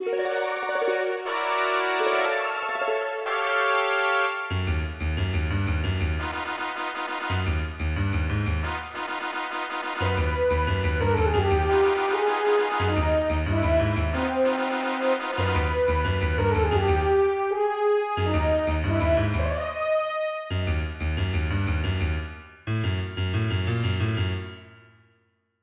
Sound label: Cinematic Danger; sonic epoch